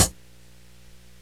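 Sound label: hi hat, DRUMS + PERC; EMU; Rubber Chicken Software Sample Bank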